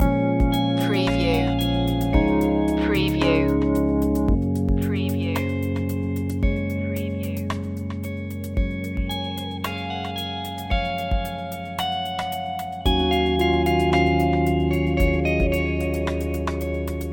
stirring spirits loop

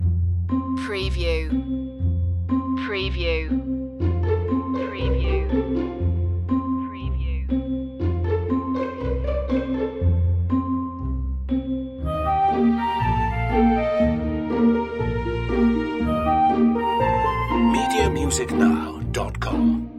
country loop

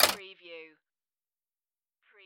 Pinball sound, dual clank

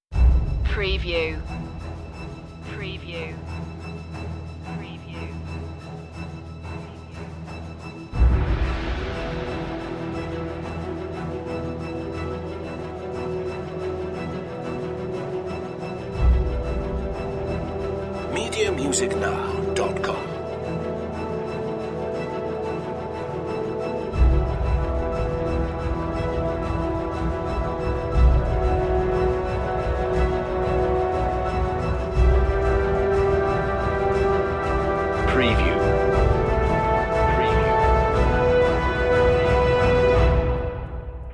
no choir

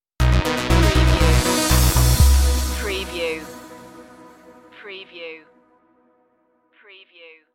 flourish stinger

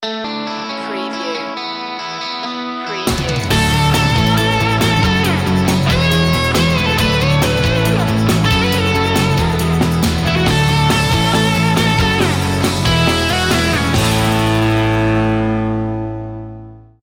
encouragement sting

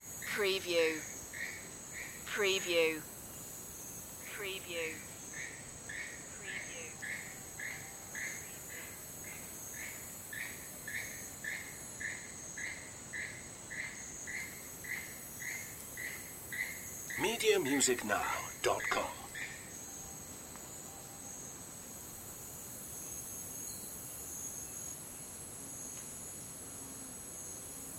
rainforest ambience

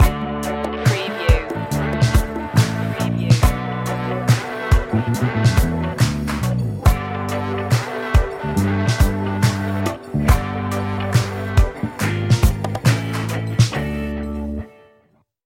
smooth groove

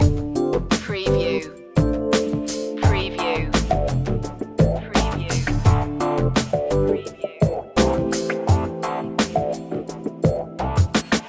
rising tech loop

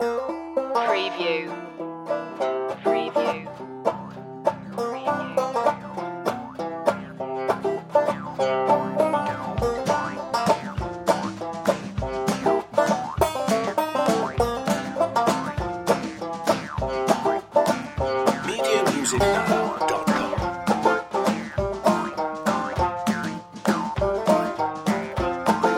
stomp loop

